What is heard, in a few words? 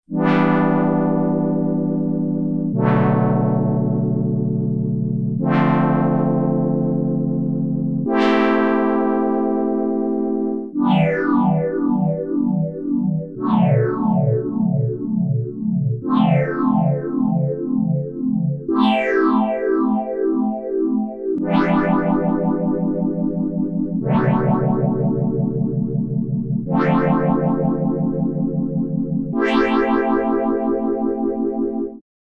KORG Poly Synth; Whirl